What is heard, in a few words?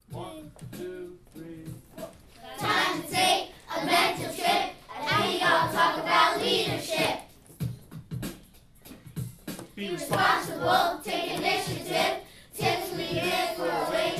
rap; pine hills leadership